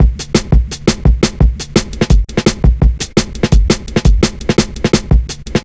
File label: 170, drum loops, drum & bass; 90 s Sample CDs; Total Drum&Bass